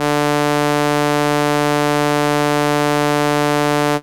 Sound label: Violin D3, ARP Solina, Unmodulated; musicradar-synth-samples